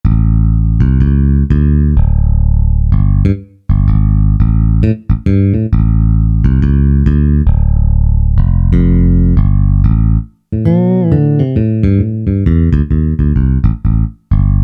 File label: Deep Bass, Alesis QS8; Factory Preset examples